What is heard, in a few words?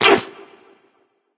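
nonverbal ouch; smite; voicepack; merlin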